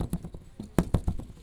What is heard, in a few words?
Bouncy Ball; BB Retail Therapy Sample Pack